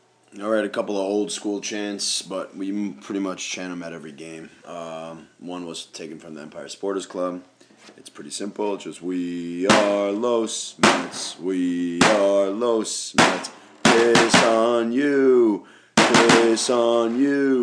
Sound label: Basic Regular CFS chants